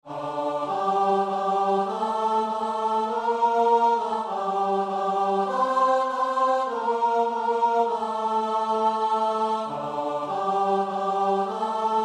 Lied 448 Soms groet een licht (koor); Gezang 448

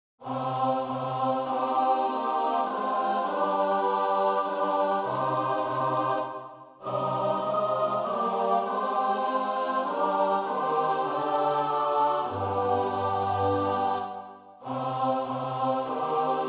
S-A-T-B; Nieuw Liedboek; NLB 903 (Zou ik niet van harte zingen)

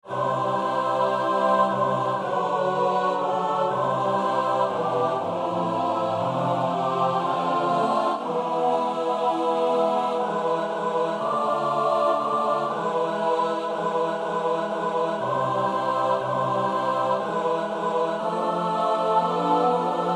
Ik ben de goede herder (S-A-T-B), Oefenbestanden

